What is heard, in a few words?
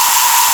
A#4 ANTIGRAVITY; Northstar; The Wizard VOL-1